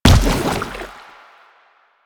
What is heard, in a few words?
Step Default, Footsteps